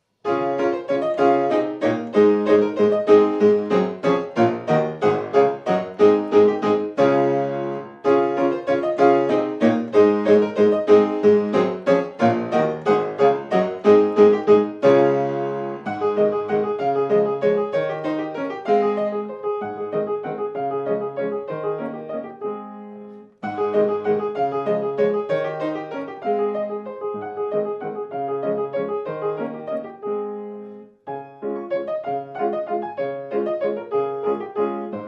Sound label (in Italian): 6 Valzer in re bemolle maggiore per pianoforte